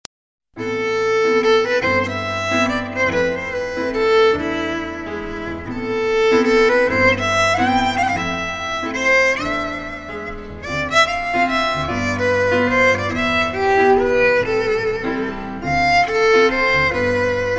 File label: Waltz